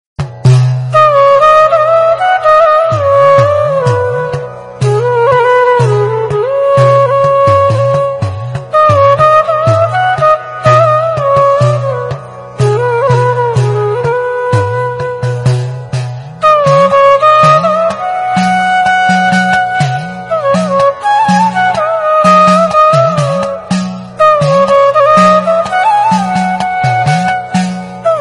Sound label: flute ringtone; Religious